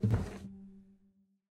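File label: music puzzle close; library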